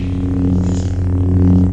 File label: Hum no move; WT588D; Arduino; SabrSound2